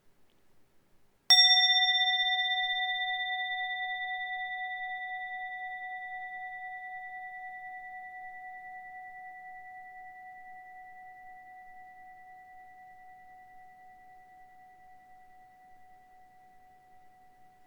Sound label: shomyo